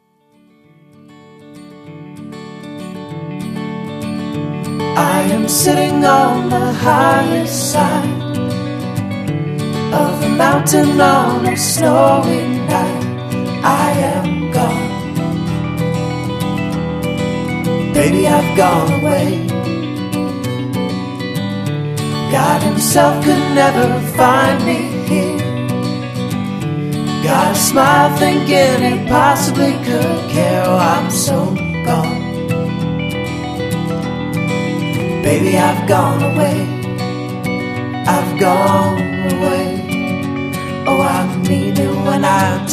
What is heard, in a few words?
The Fight Acoustic